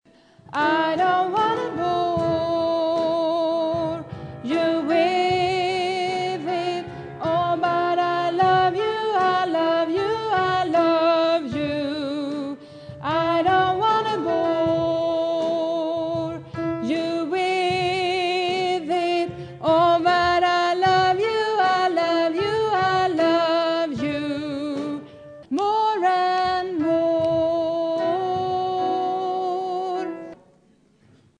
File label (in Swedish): bas